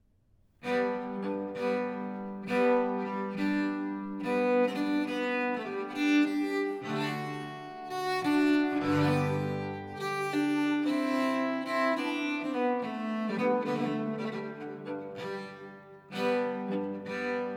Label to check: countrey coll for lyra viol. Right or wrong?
Right